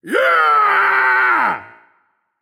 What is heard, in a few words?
yay; smite; voicepack; set